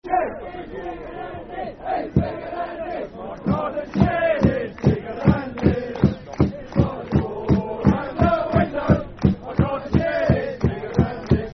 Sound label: My Garden Shed Football Chant (Soccer Song); Milton Keynes Dons Mkdfc